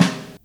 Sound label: JAZZ SD, JAZZ-DRUMSET